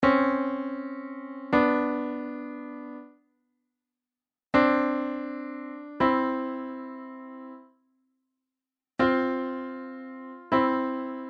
voice leading intervals

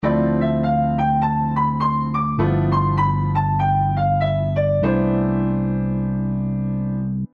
Doriano mode over ii v i

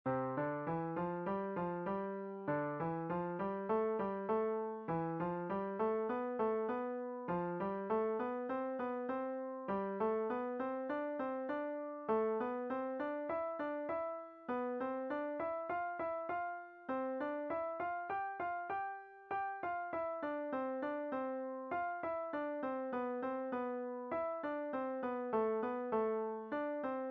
Five finger step, piano

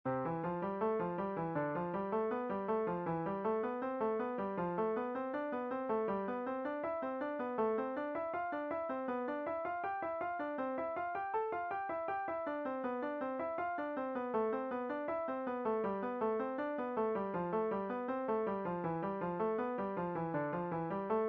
Five finger stretching, piano

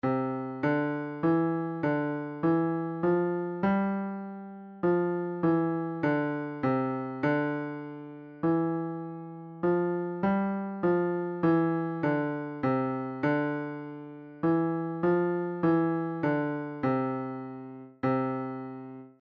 in a circle left hand, piano

